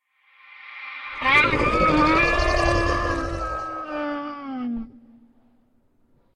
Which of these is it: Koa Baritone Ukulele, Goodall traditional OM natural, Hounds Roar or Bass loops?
Hounds Roar